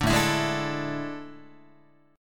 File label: A Major 7th Suspended 4th, Guitar; AM7sus4; strummed